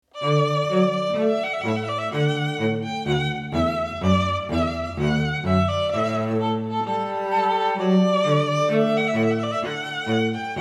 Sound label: trumpet voluntary; clarke